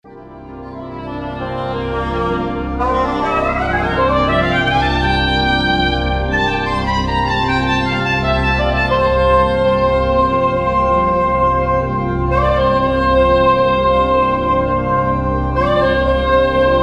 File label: Drone